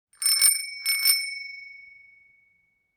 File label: Multiple rings of a metal bicycle bell; vehicle